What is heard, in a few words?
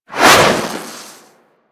Jet Polearm Spin Whoosh, weapons; Grineer